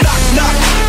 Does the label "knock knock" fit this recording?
Yes